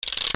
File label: SCIFI